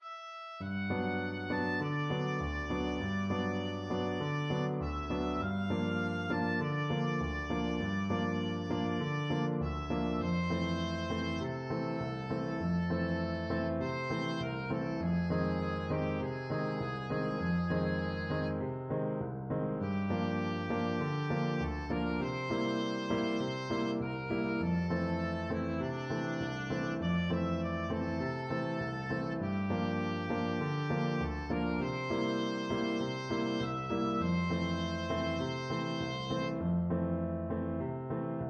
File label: beguine again, oboe